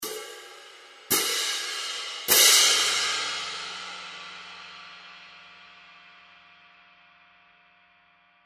build, Sabian 17" HHX Synergy Heavy Cymbals; Heavy weight ensures robust response and increased projection

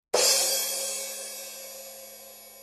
crash, Sabian B8 Crash Cymbal 16

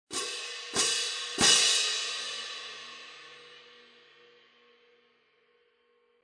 Artisan Traditional Symph Med Hvy, cymbal; 18"; Artisan Traditional Symphonic Medium Heavy; hand cymbals; Loud Crash; Sabian

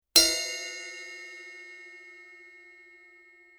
bel, cymbal; B8; crash ride cymbal; Sabian

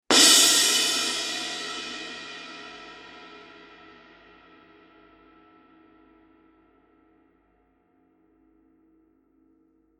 symphonic suspended; Artisan Traditional; Sabian

